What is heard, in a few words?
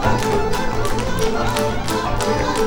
ROMANI STEPS; Best Service; World Colours